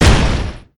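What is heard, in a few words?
attack; caidi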